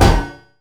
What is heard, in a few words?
epic metal hard